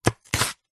crunchy vegetable single chop with knife; food

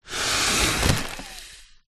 foley air blow into inflate plastic bag

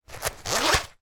unzipping toddlers winter coat; foley

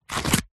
foley card rip egg box

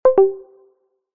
notification tone soft subtle

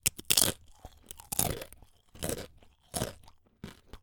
human eating tortilla chip

